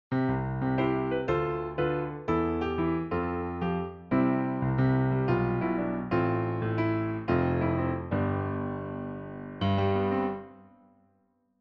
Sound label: Natural Piano